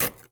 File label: glass shard; casualtiesunknown